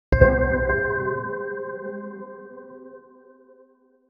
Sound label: an item select sound for, indie